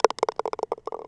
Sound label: CUP; GTFore